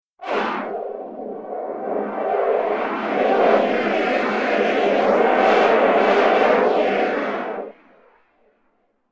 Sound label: smooth chaos; philippos